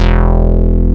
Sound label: MINIMOOG